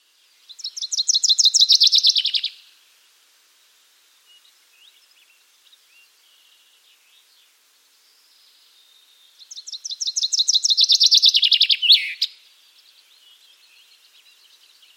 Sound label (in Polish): zięba zwyczajna